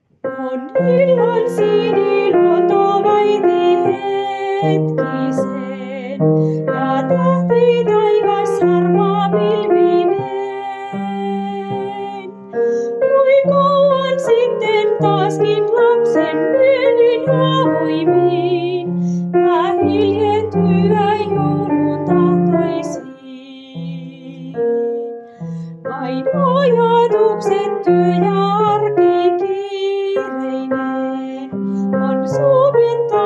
altto

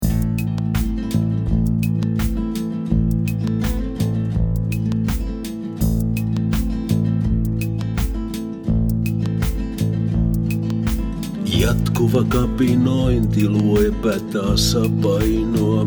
Jatkuva kapinointi (Bass)

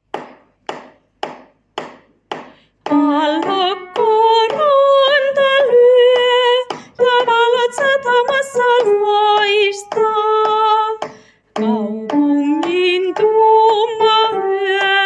Sataman valot, sopraano

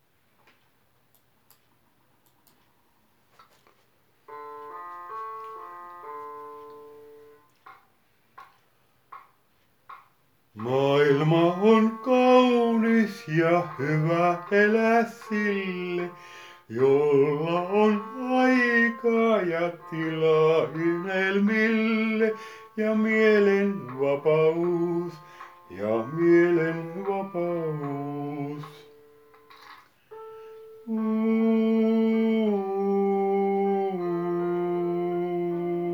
Maailma on kaunis. tenori

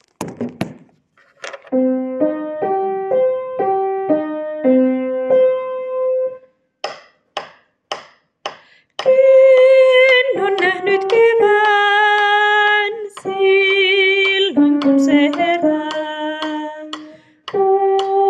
Laulava sydän, sopraano

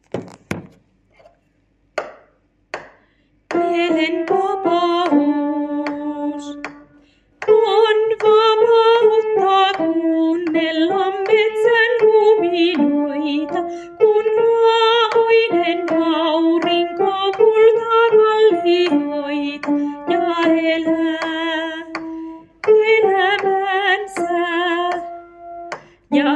sopraano

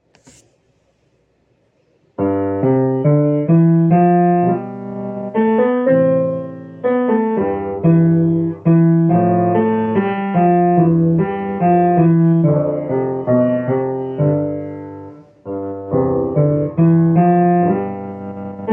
sopraano

